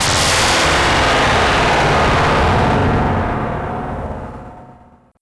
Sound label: Final boss death; Touhou Jitsuzaisou Dream Logical World